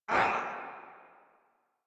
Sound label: Pain; Warframe; Leekter; en